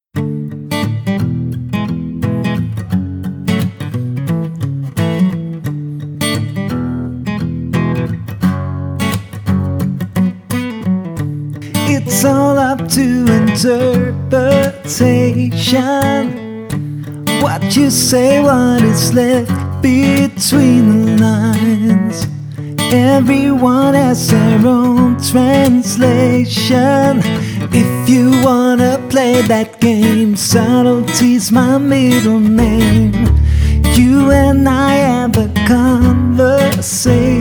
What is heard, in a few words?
acoustic